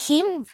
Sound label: Grab; SM64 CO-OP; Triple Baka Pack; Miku